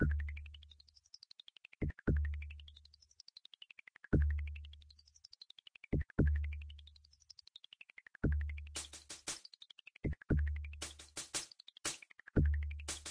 Dungeon; Wolfenstein 3D

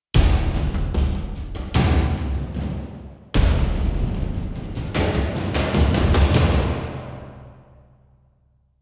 Combat; The Witcher 3 Wild Hunt; Skellige; Combat In

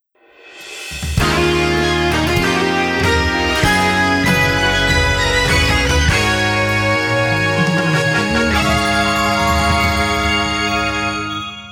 Course Intro Fanfare; Mario Kart 8 Deluxe; Switch, Wii U

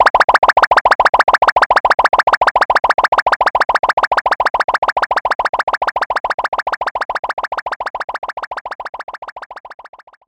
Beat

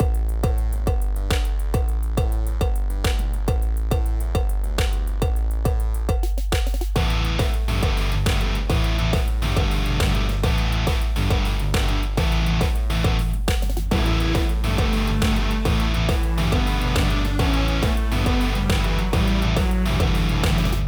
Dark Loop; Rizap for Nintendo Switch Taikan Rhythm Training

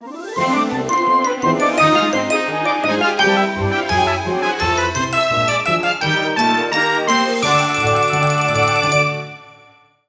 Jingle Lesson unlocked; Disney Art Academy

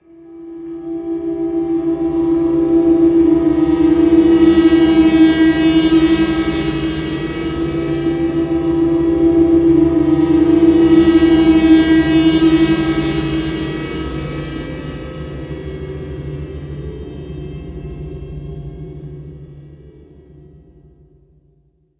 Dark atmosphere; jenny leclue detectiv

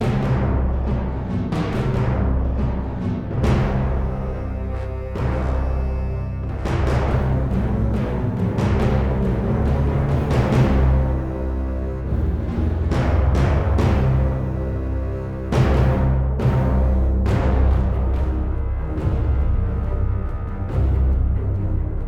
Bass n Rhythm Loop; BRPG Hell Spawn; demonheart hunters

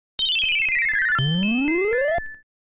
Game Start; Famicom 20th Anniversary Original Sound Tracks Vol.3

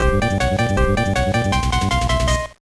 Jingle; Inukko Club Fukumaru no Daibouken; GBA

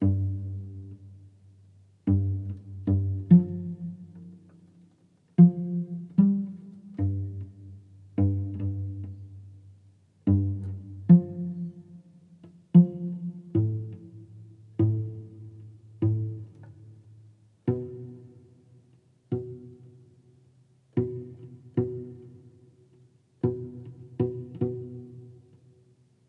Music Level Fight Loop

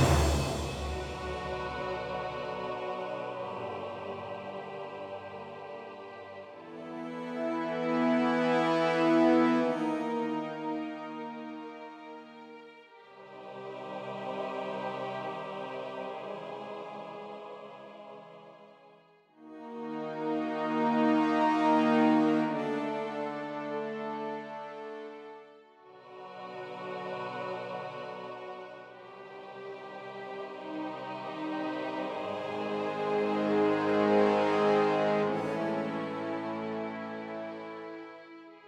Calm Puzzle; unruly heroes

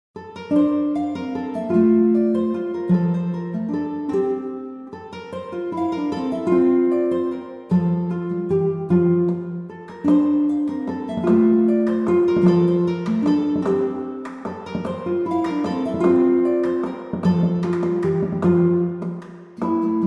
Bard Draft

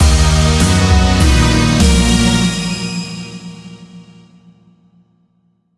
Rogue Victory

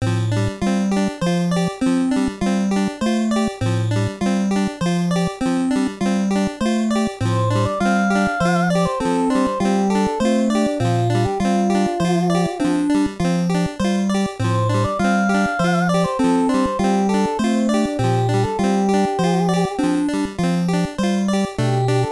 High Old Chinese Restaurant BGM; Famicom Mukashi Banashi: Yuuyuuki; FDS

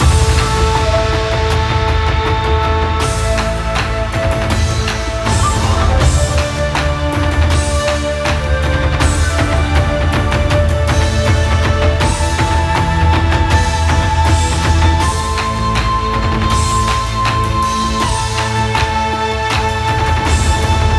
TENSION LOOPABLE

